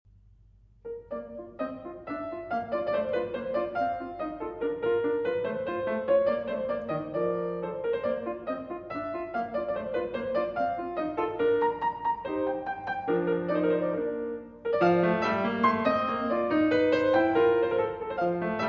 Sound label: Piano Sonata No. 17 in B Flat Major, Allegretto